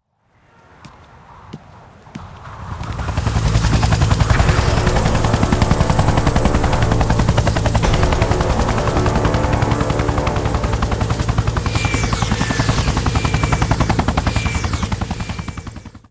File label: Intro Tokyo Heli Male; KickBeat